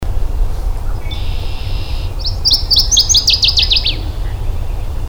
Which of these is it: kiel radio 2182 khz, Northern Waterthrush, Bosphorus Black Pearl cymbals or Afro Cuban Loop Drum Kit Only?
Northern Waterthrush